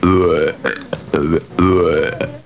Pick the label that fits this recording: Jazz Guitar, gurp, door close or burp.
burp